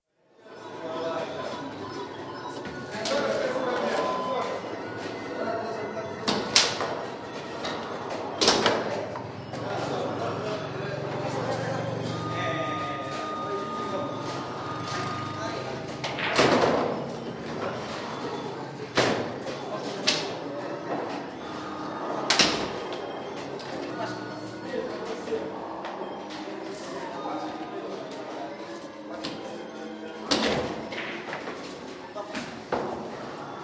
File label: Greece: Amusement arcade atmosphere with machine noise, clunks from table football, shouts; Arcade Sound Effects; BBC